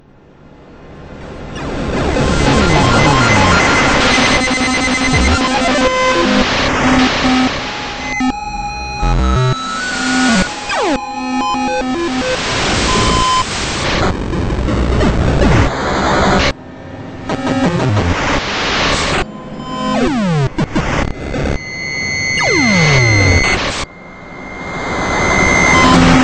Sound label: RINGTONE